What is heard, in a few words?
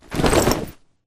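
grunt movement foley loud long; Titanfall 2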